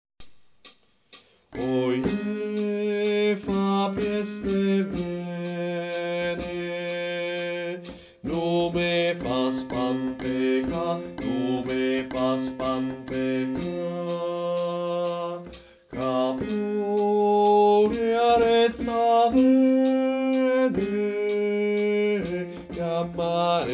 Bassi, Coro; O Marenariello

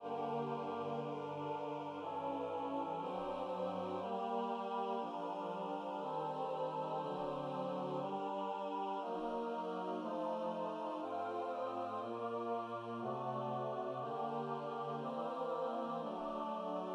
Ninna nanna russa tutti

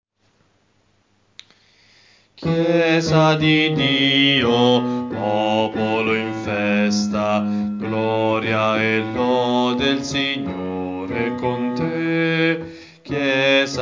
Bassi, coro; Coro Isola del Giglio; Giovedi Santo

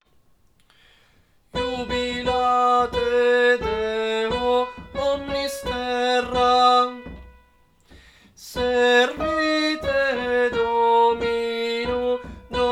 tenori, coro; Coro Isola del Giglio; Natale; Jubilate Deo

